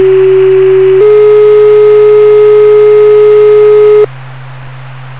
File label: two tone paging 378hz 422hz 1sec 3sec